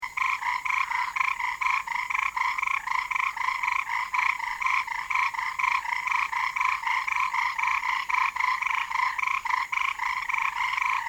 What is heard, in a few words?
Fejervarya Sakishimensis Is Calling